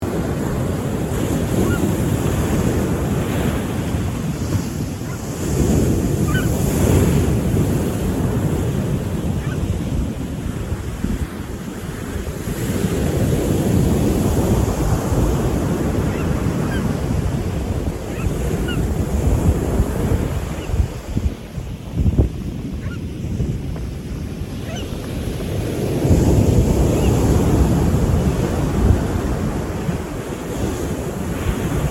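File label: waves and seagulls; seaguils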